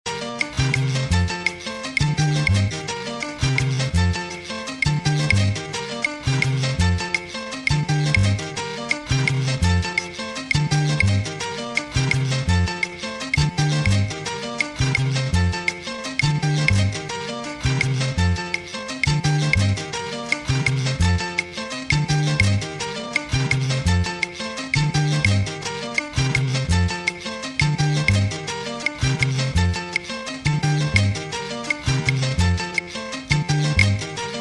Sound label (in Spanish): clave montuno tumbao